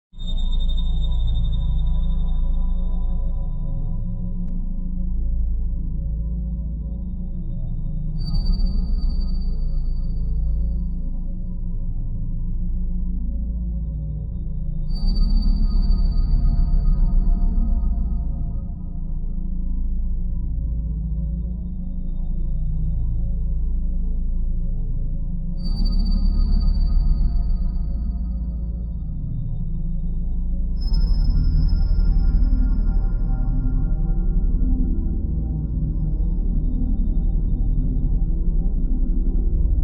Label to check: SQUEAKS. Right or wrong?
Wrong